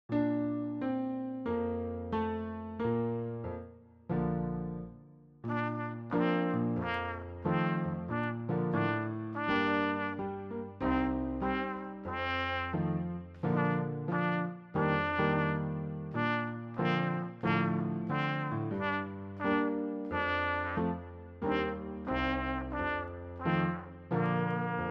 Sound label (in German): Lieder Trompete Merrily